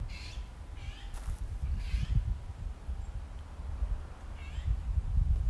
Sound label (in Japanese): オナガ 警戒